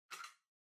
Pendant Light Far Pull

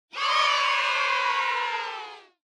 Cheer "Yay" High Short Solo

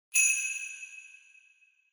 Sleigh Bells Reverb Knock Hard

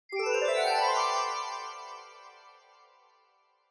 Scene Change Low Up

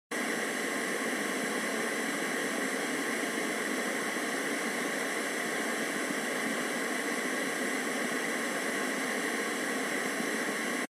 Radio Signal Noise FM

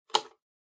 Tabletop Timer Digital Toggle; 卓上タイマー デジタル01-07(トグル); タイマー機器

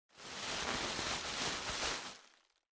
Packaging Air Cushion (Rustle); 梱包用エアークッション; ガサガサ; 梱包材

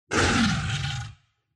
Monster

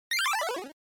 NES Action Miss

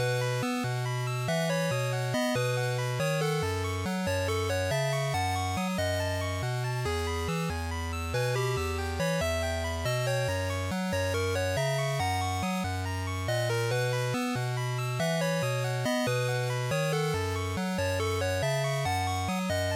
GB RPG Title Loop140

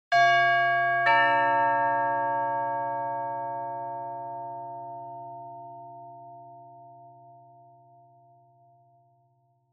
Doorbell Mech Tone Low Close; ドアベル 機械式 トーン; 低 近い

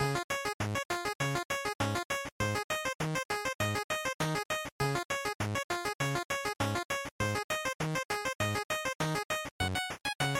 GB General Title Loop 100

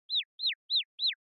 Motion Dizzy Slow Short

